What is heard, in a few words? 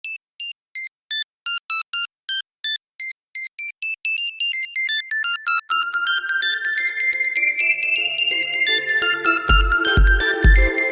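Sony Ericsson ringtone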